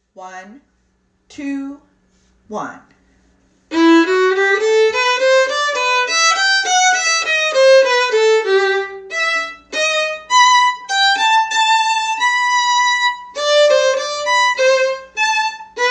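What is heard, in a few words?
Partita violin 1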